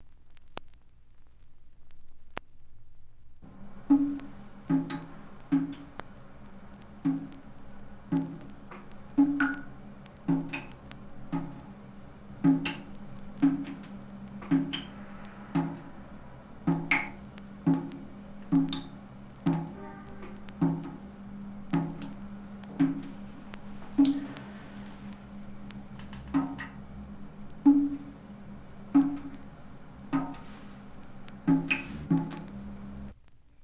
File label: Water Sounds (Drip In Bucket)